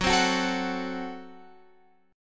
Gb Diminished, down, Guitar, Open F; strummed; JGuitar

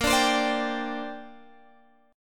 Bb 6th Add 9th Bb Guitar Open G

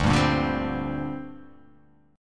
D 7th Suspended 4th Sharp 5th, down

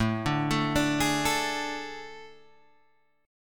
A Major 7th Suspended 4th, Guitar, Open G

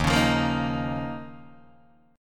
D sharp 7th Suspended 2nd D sharp, Guitar, DADGAD

